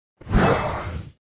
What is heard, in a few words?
Boss Attack